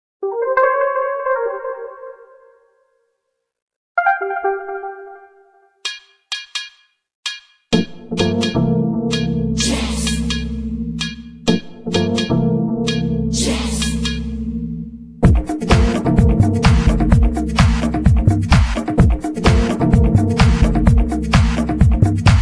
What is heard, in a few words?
Movin Jazz